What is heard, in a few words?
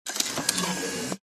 technology video game console disc eject; nintendo wii; zapsplat